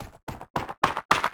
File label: Stairs up; 100rogues